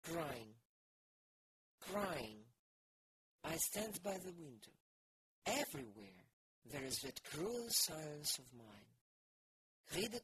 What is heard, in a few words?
crying